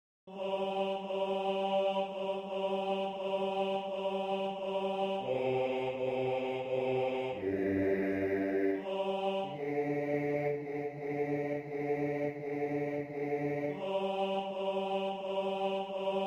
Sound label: Away in a Manger - Bass